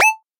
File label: Skip; OpenTaiko